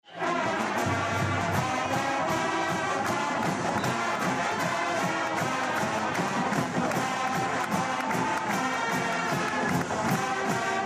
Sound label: fight song; geneva